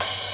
subtle open hi hat c key, Royality free open hat one shot tuned to the C note